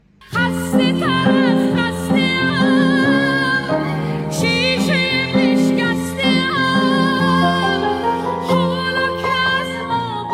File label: Piano